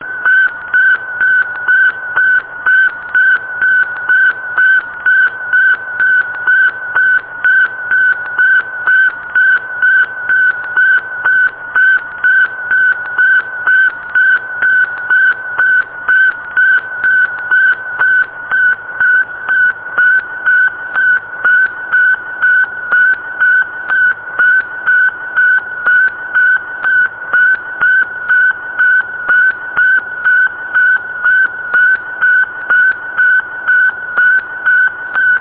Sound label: sputnik signal; civil defence